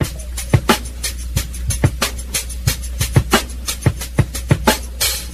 room noise, drum loops boombap

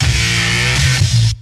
hard guitars

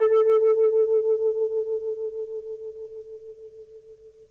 vibes, Piano; Notes

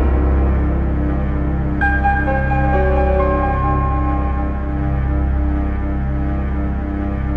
piano creepers coming; Loops; Sad

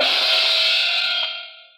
gong

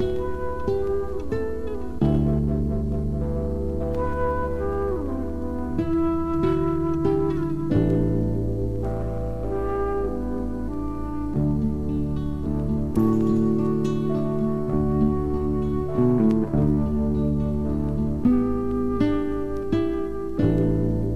guitar, Jazzmatazz Loops

